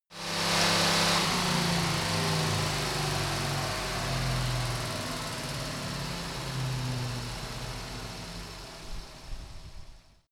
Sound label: car camaro slow down engine